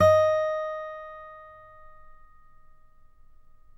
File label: COLLINGS D#5, Guitars; Spectrasonics; Hans Zimmer Guitars VOL-2; FNGSTYLE PFM